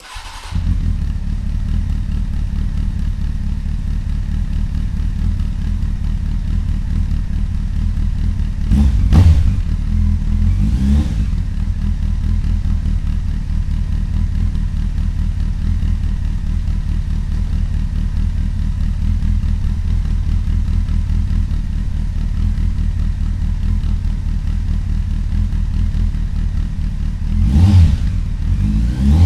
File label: tips installed, CB900F